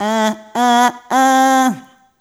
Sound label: Rhythmic A